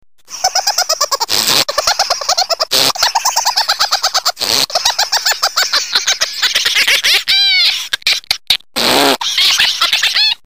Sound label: drunk mosquito; sms